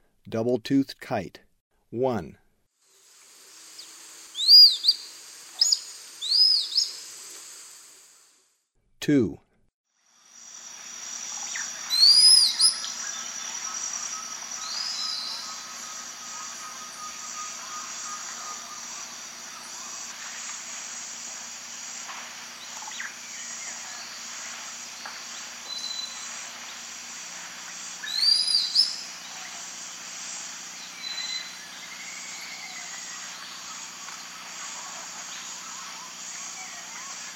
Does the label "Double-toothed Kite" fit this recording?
Yes